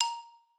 xylo

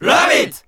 SE GAME BREAK; maimai

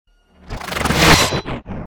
fx cinema

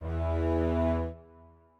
strings

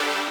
lead

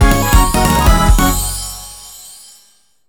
JINGLE CHARA GET; maimai

